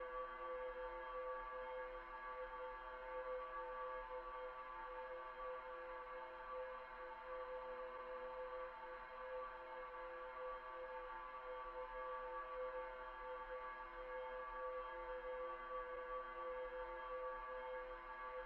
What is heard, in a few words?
Sea Captain Room